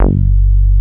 ANALOG BASS

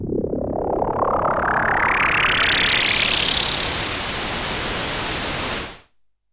Pulse, Filtered Noise; noise hiss crackle

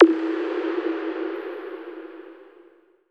Weird FX, Underground UK House & Electro; Sonic Foundry (Sony Creative Software)

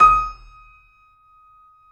EB5, YC7 Piano mf

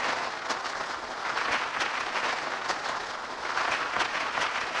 Cracklature, sparse soundscape

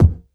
bass drum, Drum & Bass; Ueberschall; Drum & Bass Resonance

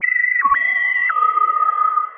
Quasar Whistle 110BPM

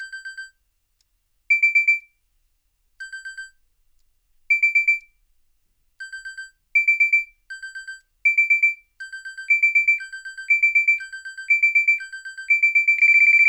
alarm; AKAI S-Series CD-ROM Sound Library VOL-6

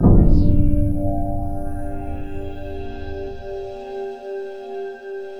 ICICLES R; NorthStar - The Wizard VOL-2; DRY ICE

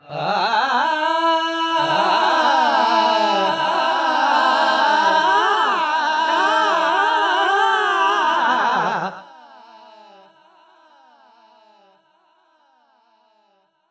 Vocals Male World Pop

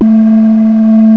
ZAMPONA, WIND

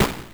Snare, Genesis; Virtua Fighter 2

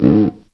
CUICA; East West - Global Sounds; AFR.BRAZ

